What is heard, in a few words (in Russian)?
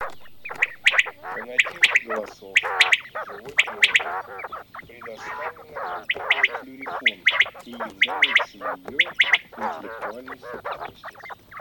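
Перепел Quaglia, chip mini для манка; plurifon; chip mini 18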